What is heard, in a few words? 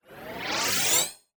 Laser Charge Up; SoundsCrate